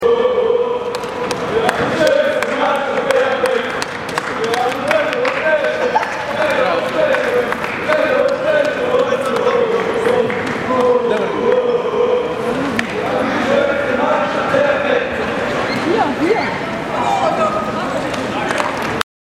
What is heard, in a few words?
Hamburg chants